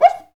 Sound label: PRC XCUICA, Skin Drums